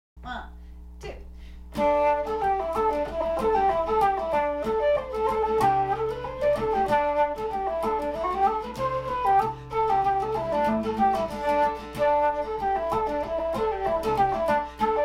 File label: flute and guitar